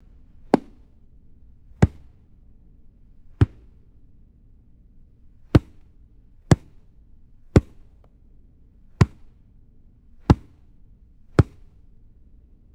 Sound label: dull thuds of a bouncing tennis ball; sports